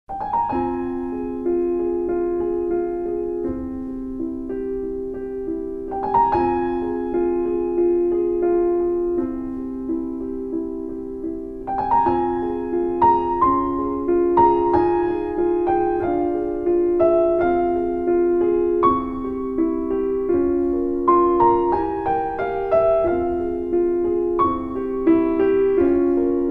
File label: suite for piano; Solo Piano